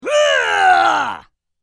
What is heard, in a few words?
Mobile game character power attack; video game